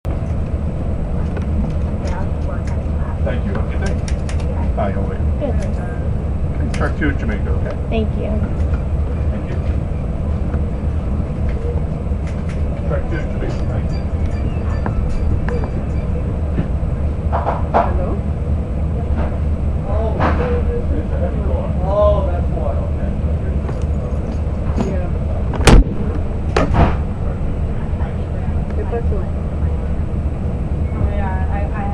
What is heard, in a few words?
LIRR, LIRR Mineola to Penn Station; Location: LIRR Mineola to Penn Station; train, worker’s voice, hole puncher